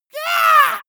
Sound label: monster painful cry high